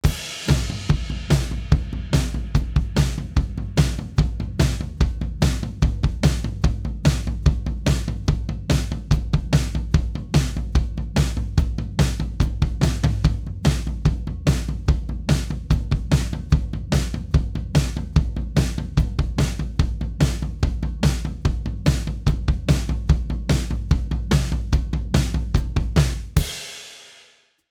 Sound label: drum buss before